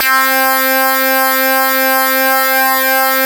MS20, LEADS; Masterbits; Vintage Voltage

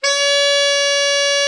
ALT, Sax, ALT.VEL-FALL; Giga Samples Collection

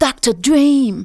vox, Techno Trance; Ueberschall

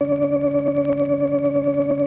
ORCHESTRON G D2; Sonic Reality; Vintage Timetraveler

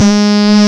Industrial Analog Single Notes Short

